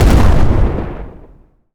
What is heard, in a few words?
explosion large